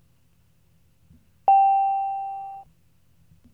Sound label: chime